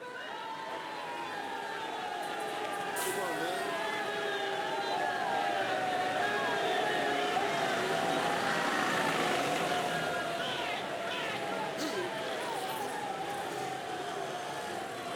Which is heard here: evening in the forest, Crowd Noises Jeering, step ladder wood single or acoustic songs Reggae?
Crowd Noises Jeering